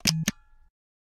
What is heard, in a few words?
Old Camera; Samsung Galaxy S24; Retro